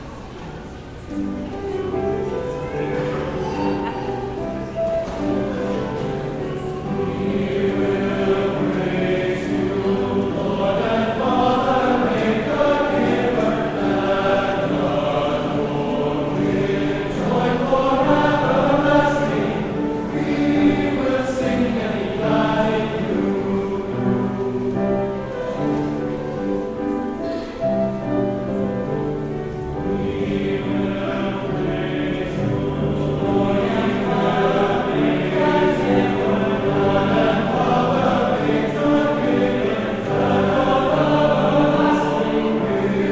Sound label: Choral